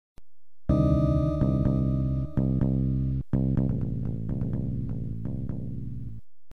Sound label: Failure; apb; amiga